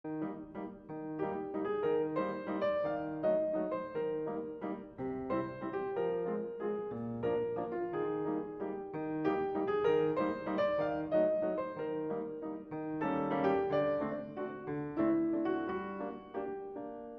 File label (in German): Klavier; Uwe Balser; Tasteninstrumente